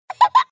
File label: laugh delighted giggle child female pitch short